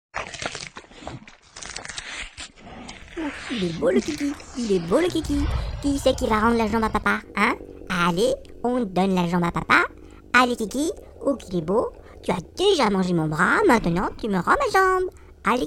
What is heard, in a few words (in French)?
mort demon; doom; mort de marines par certaines créatures